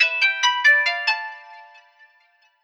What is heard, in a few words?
Menu To Game Transition; Swap Blocks; Wii U